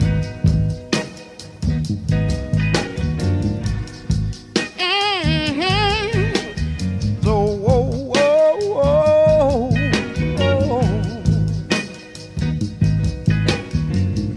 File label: guitar because black